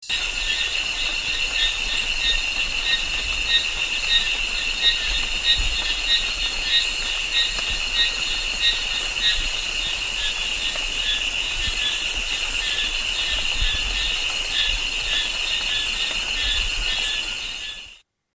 Eleutherodactylus croceoinguinis, Frogs of Ecuadorian Amazon; S. America